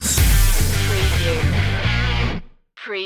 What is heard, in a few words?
beat on fire stinger